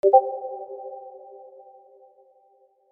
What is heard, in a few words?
Retry Jingle; friday night funkin vs. hecker ost pc mod gamerip